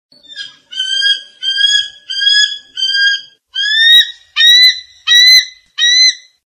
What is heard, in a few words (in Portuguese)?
som de aguia selvagem